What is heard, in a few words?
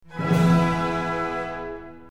accord